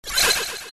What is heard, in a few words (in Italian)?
suoneria botton; beep